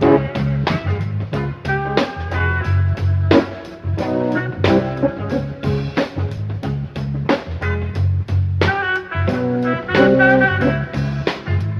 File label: sax keys, Loops; Phonky